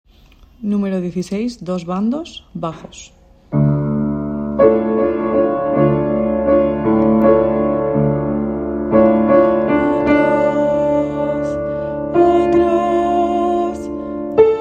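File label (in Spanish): dos bandos bajo; Bodas de Sangre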